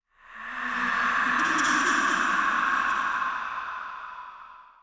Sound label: Ghost; Seer; GamigoNA